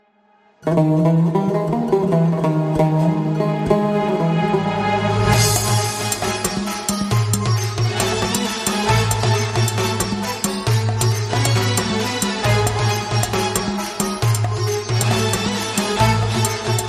Oriental Club

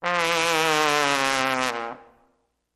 Trombone Gliss Down With Vibrato

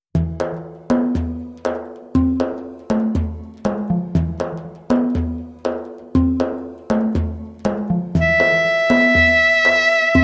Middle Eastern Soundscape

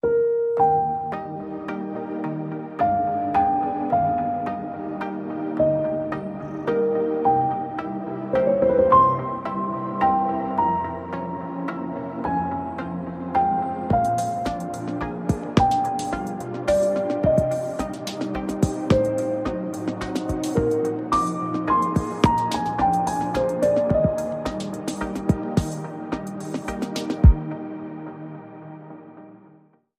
Nocturne Op 9 No 2 Downtempo Remix; Chopin

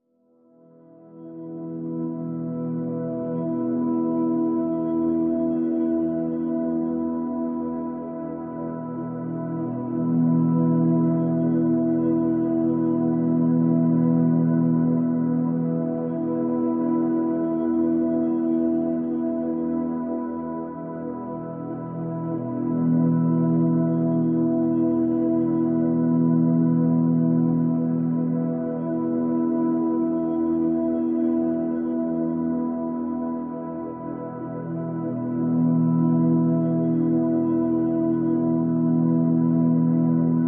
Pads (E)

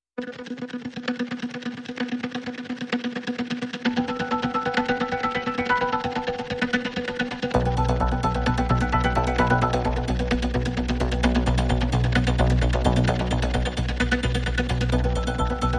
No Pads

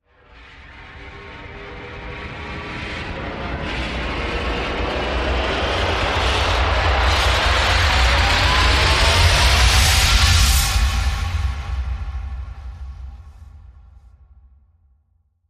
Cosmic Flame Rise

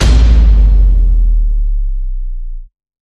One Shot Sub Hit

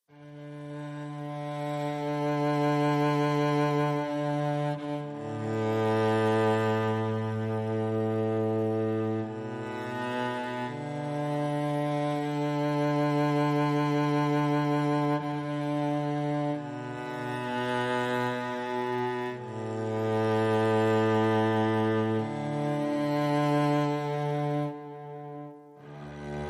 Cello Only

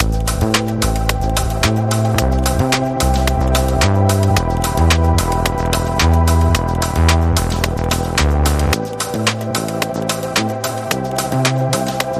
Alpine Ambience Rhythm; 60sec

